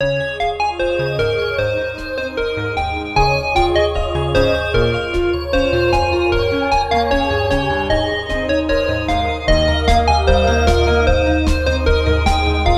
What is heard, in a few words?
sparkle stage call